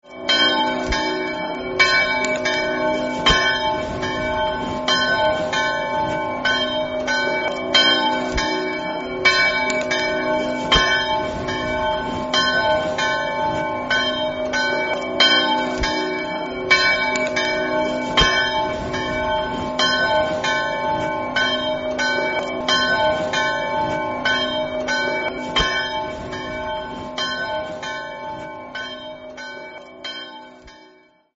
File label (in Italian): gradisca, campane